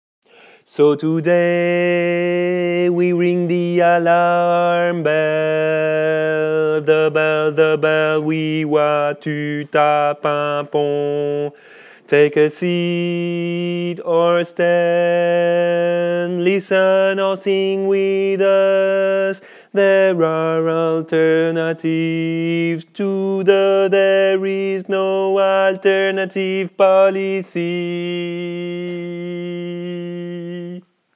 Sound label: refrain tenor